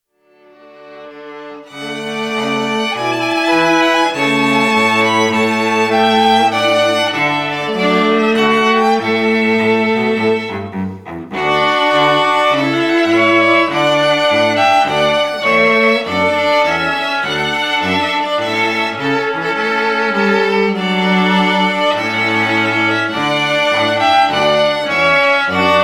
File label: Trumpet Voulentary